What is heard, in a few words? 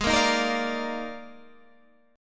Eb Major 7th Suspended 2nd Suspended 4th